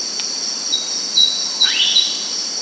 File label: Orange cheeked Parrot